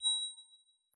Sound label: notify; Scrat